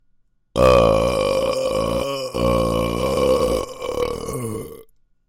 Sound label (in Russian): dlinnaya otrizhka